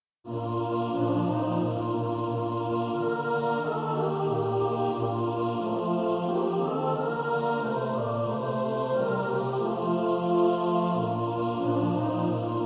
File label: mixed